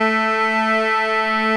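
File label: DUAL TENOR, Bagpipes; E-MU Formula 4000 Series Vol. 4; Earth Tones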